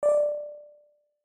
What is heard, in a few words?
Modern Ui Accept